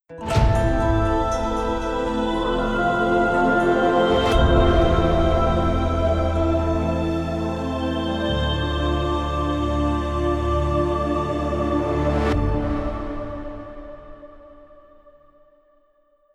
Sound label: win theme